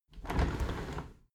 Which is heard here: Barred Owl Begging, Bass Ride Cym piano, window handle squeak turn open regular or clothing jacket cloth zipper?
window handle squeak turn open regular